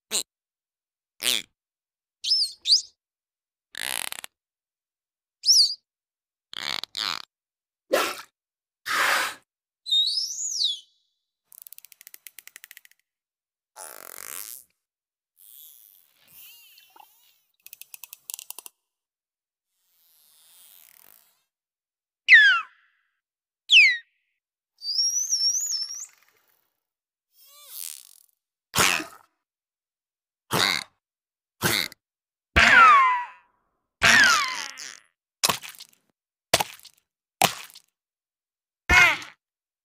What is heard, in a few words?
Amazon river dolphin; دلفین صورتی